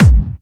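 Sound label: BD Clubby, bass drum; VEC1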